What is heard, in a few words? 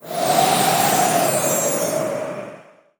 blizzard